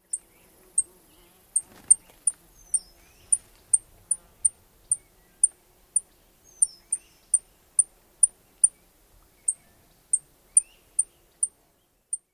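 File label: chips, Swamp Sparrow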